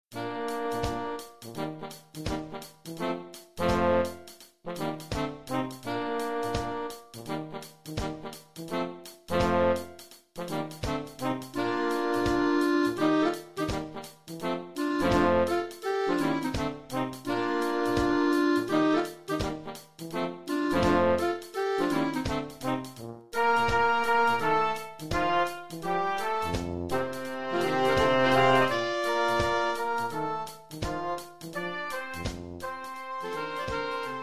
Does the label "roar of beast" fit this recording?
No